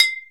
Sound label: BOTTLES; E-MU Formula 4000 Series Vol. 1; Hip Hop Nation; Hip Hop Kit 1